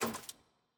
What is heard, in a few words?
record next click; caitlyn